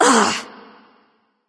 ugh; smite; neith

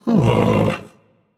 nv ugh; smite; voicepack; ganesha

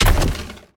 footstep; argus; smite; hera

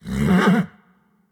defeat pet vocal; smite; lancelot

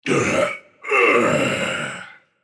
Grunt Lift; bakasura

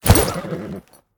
activate; Smite; god Lancelot

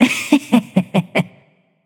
heh heh; smite; medusa

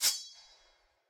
minion sword; smite; npc; roman archer

